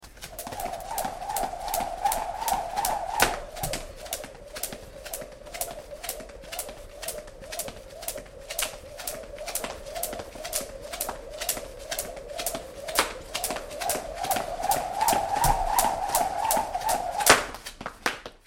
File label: Boxing jumping rope